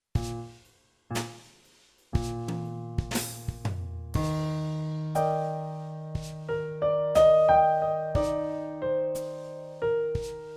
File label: Long note warm up in Eb; Level B